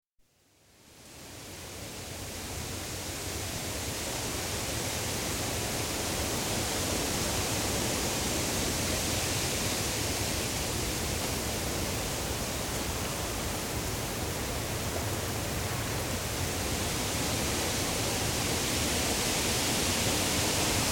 Mixed Deciduous Woodland atmosphere on a windy day, with occasional flying insects; Outdoor; BBC